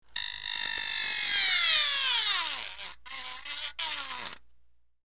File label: Sporadic Metal Drill Running Out Of Power